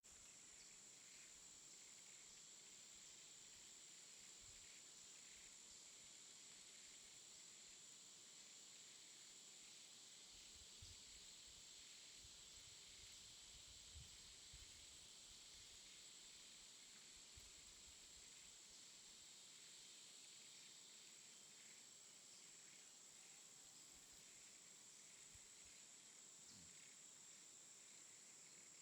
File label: jungle atmosphere afternoon